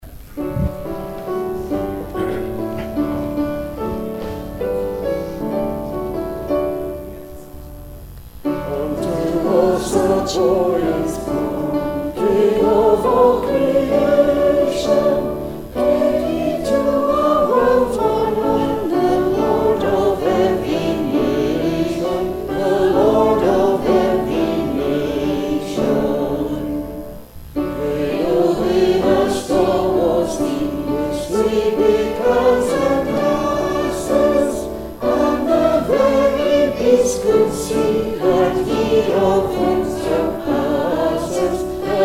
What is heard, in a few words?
hymn; Hymn 331 'Unto us a child is born'; nativity